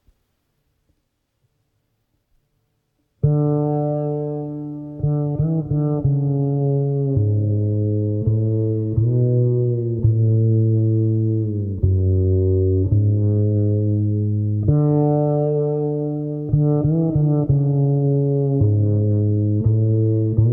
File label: rhythm